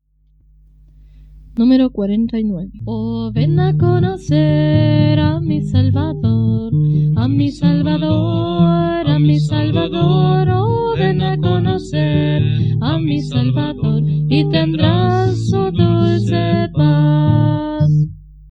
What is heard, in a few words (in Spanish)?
Coro, himnario niños